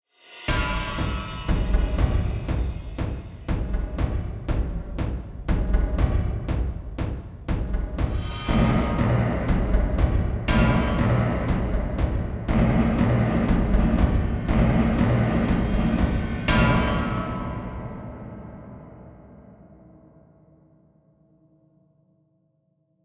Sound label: Percussive drums dystopian